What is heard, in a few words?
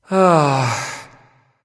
sigh; smite